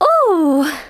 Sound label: Emote Cheer; Twitch Skijump